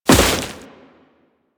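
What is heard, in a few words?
Crewman Step Vegetation, Footsteps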